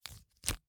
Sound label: Slap bracelet, smack, slap, whip, felt, plastic, unravel, slip; Eiravaein Works; Vaeyan IV